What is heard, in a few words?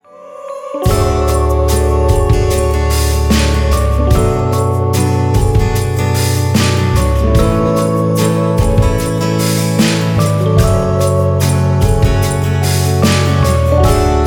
instrumental